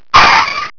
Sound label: SKID